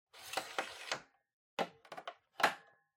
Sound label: Portable Stereo Antenna Shorten